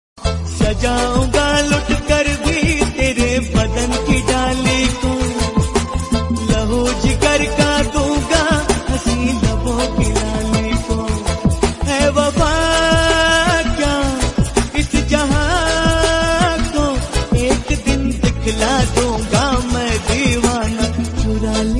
Bollywood Song Ringtone

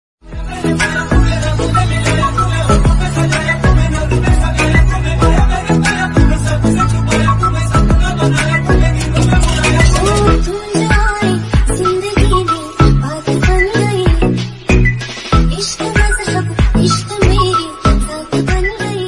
Song

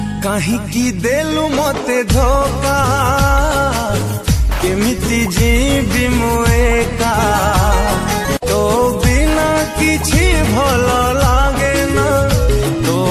Odia Sad Song Ringtone

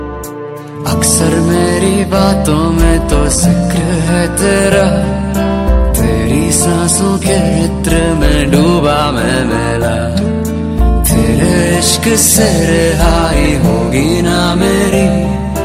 Super Romantic Hindi Ringtone for Mobile

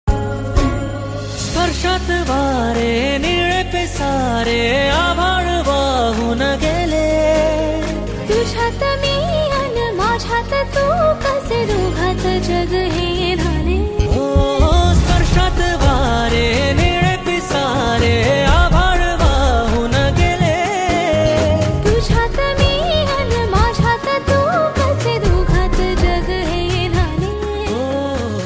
Song, Marathi Ringtone

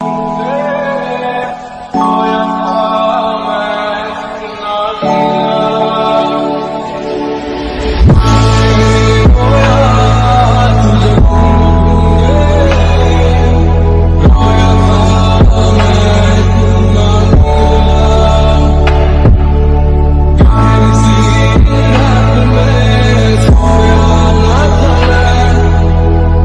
Sad Ringtone

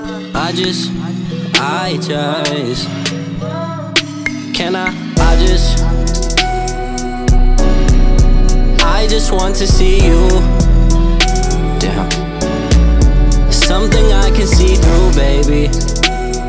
Music Ringtone

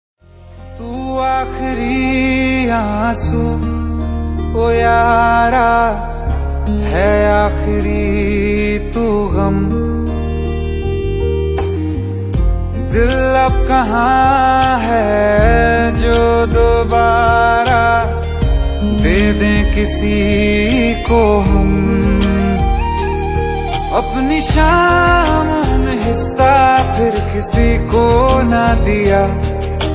Sad Hindi Song Ringtone

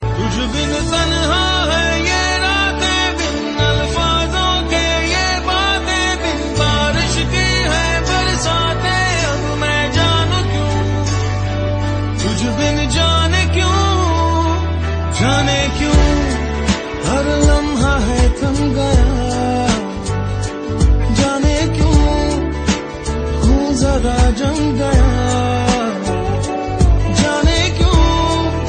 Sad Hindi Song Ringtone

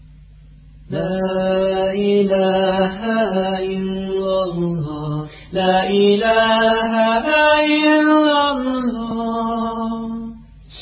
La ilaha Illallah, Islamic Ringtone

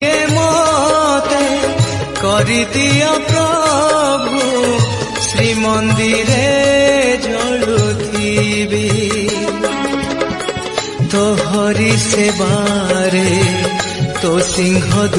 Bhajan Ringtone; Jagannath

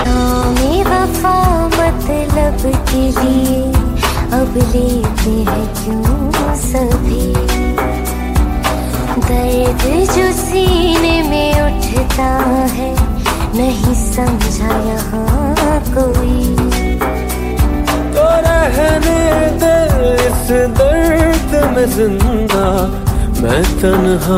Female Ringtone

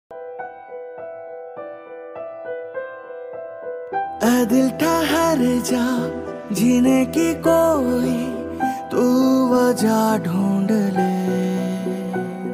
Sad Ringtone

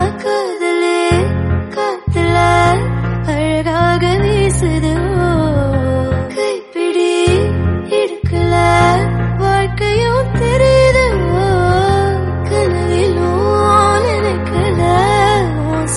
Tamil Female Ringtone

